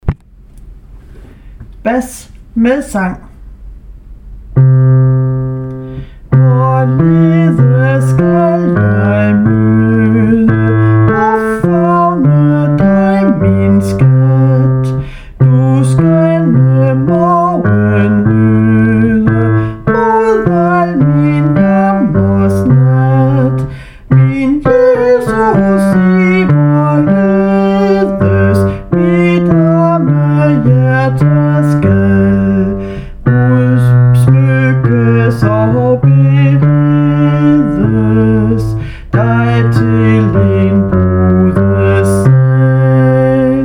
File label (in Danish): bas med sang; hvorledes skal jeg møde